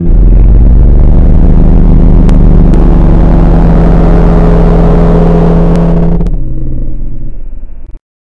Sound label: Low Roar; Princess & Conquest v0.20.06